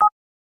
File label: button